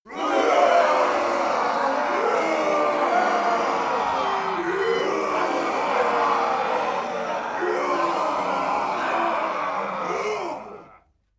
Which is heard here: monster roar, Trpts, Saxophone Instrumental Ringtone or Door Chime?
monster roar